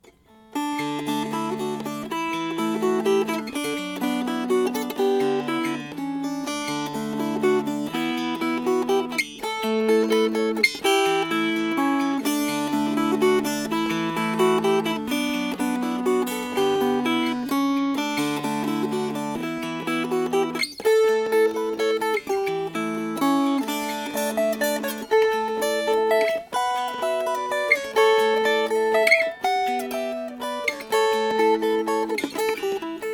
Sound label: Fingerpicked; Munster Cloak